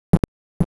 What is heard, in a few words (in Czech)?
balon; Krtek